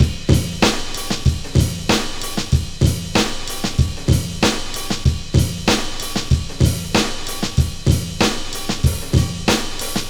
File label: Beat 095, Hip Hop